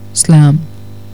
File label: slam